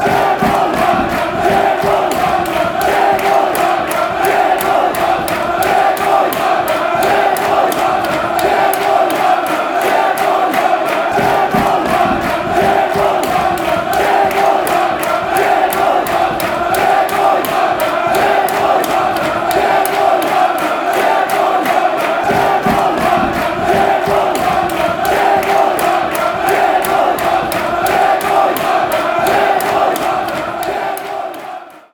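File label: chairboys barmy army, football chants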